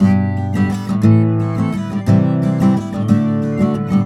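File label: guitar, 120, Celtic; USB Soundscan vol.42; Celtic Flavours; AKAI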